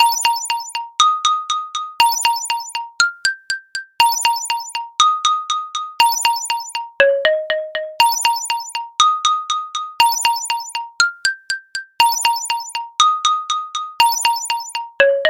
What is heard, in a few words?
Xylophone Ringtone